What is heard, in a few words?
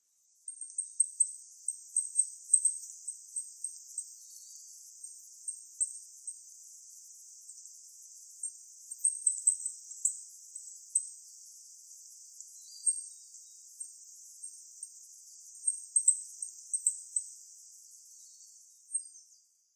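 Grey Bunting; kuroji; utsunomiya; koe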